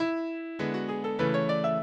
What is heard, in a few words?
minuet, mozart